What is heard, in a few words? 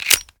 pi mike reload xmag stock magin; gmod